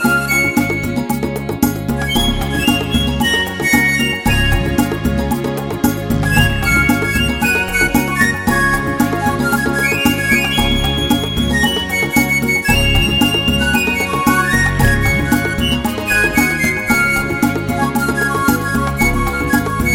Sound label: Samba Power